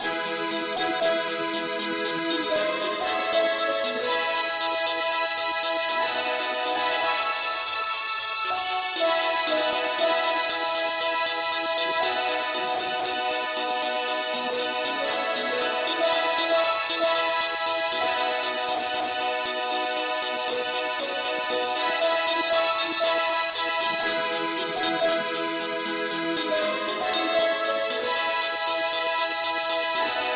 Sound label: mandolin Lead key e